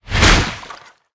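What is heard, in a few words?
magic explosion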